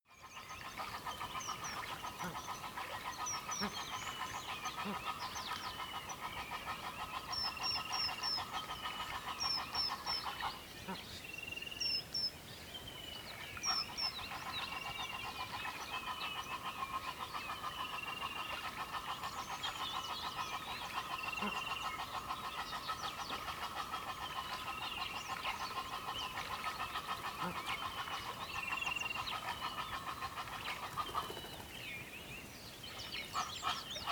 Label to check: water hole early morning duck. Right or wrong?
Right